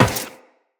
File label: Run Metal, Footsteps; Tenno